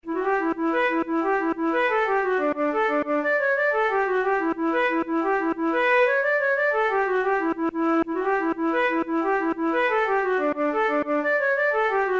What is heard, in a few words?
jig